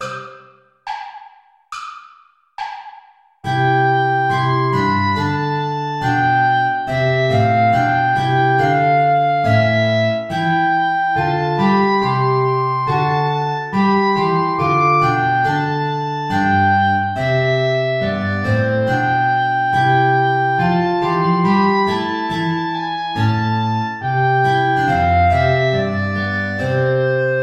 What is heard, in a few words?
play along without guitar